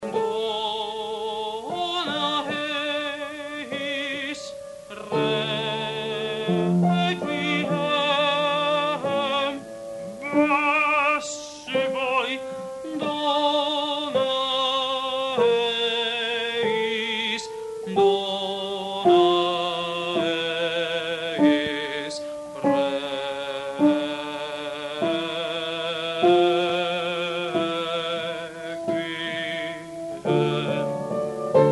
Lacrimosa soprani; Mozart

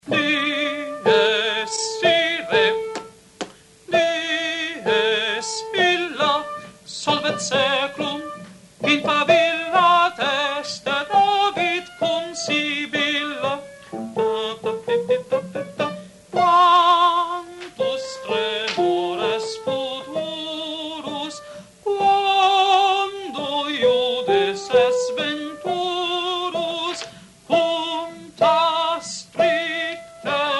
Dies irae soprani; Mozart

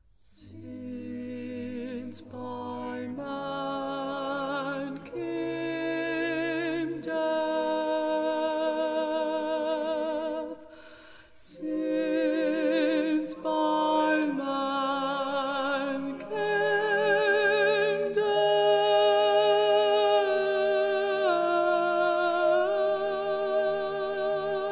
Since by a man came death contralti; Messiah; handel messiah

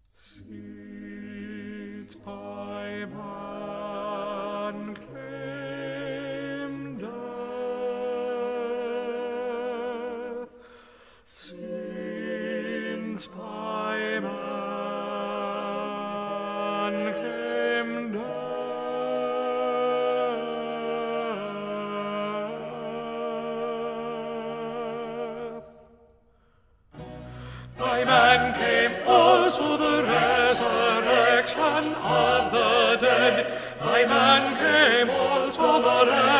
Since by a man came death tenori; Messiah; handel messiah

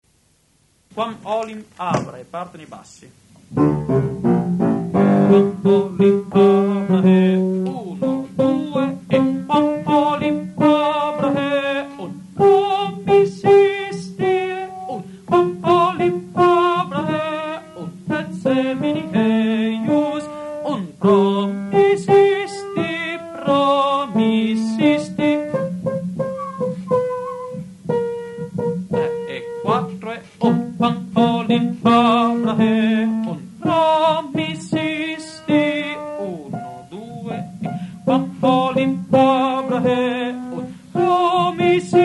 Requiem Domine Jesu tenori; Mozart

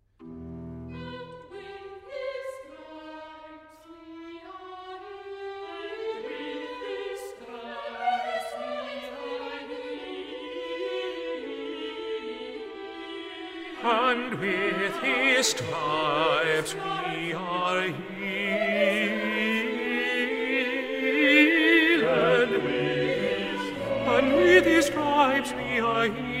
tenori; And with his stripes we are healed; Messiah; handel messiah

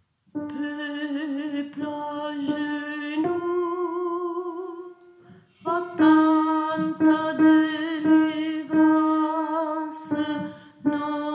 Cantique de Noel contralti; natale

